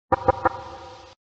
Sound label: knock; mgs